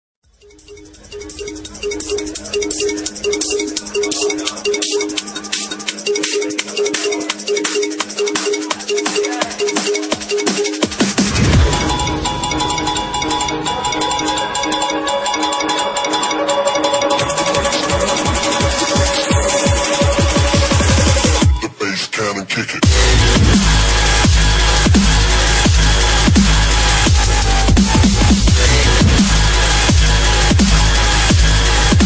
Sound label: drumstep